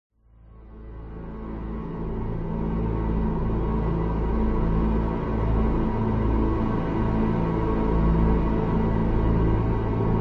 horror drone atmosphere musical synth pad airy howling eerie wind; zapsplat